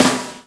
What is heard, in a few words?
SD HOU, Dance Mega Drums; Best Service